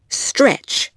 stretch

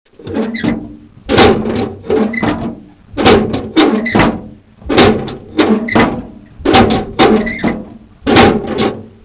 M BOX

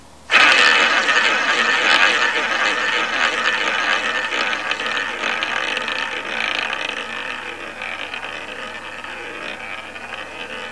ball roll; VEGAS Girls

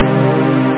synth chords; da capo vol1; instruments

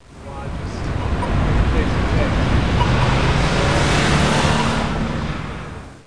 amb

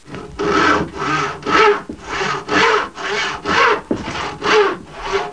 sawing; Sound Library 2000; Wayzata Technology

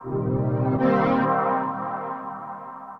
SYNTH PAD AMBNT 160 C, Ambient; eJay; Sphere

